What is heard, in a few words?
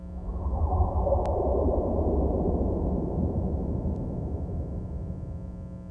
bell